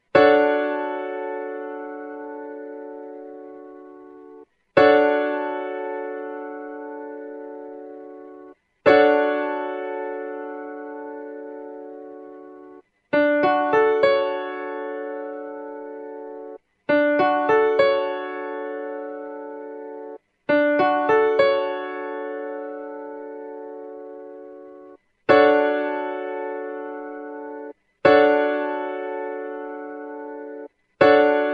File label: Dmaj7 Piano Chord